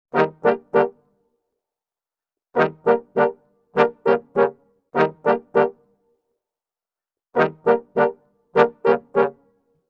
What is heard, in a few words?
100 brasses, Orchestral arrangements, Gmin; PowerFX - Downtown Orchestra